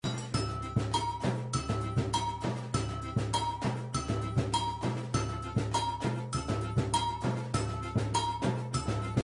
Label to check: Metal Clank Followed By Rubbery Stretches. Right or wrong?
Wrong